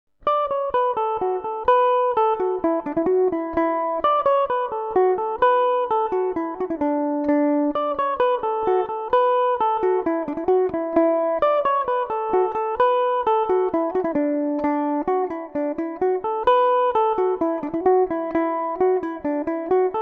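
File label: D major revelation, Irish mandolin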